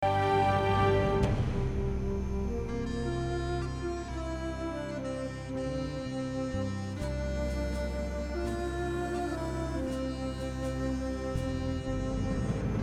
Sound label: Flute Solo